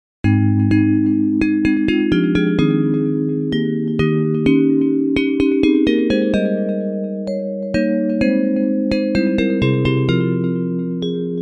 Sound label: Bells, Hymns